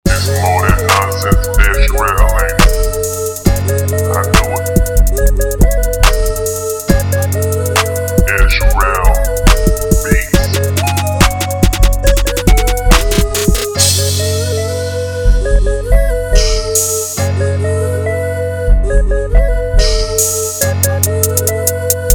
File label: Oasis Beat